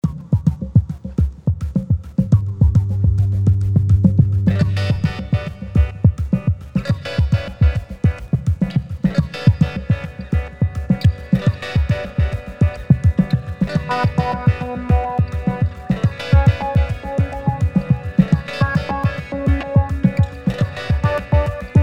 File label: Ringtone; Stalker SoftSuns